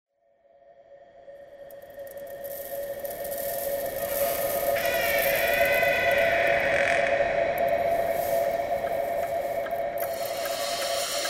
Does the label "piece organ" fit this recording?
No